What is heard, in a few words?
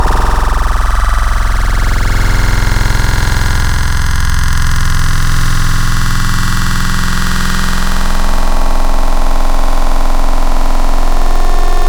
Spectral Groans; Wavetables; Synthetic's Serum Collection; ESW Core Wavetables; Echo Sound Works Core Tables